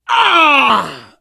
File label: hurt major, biker; source engine; left 4 dead 2